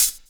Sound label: Hhat, Hihat; Equipped Music; Loungin House